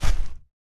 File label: LARGE CREATURE FOOTSTEP, monsters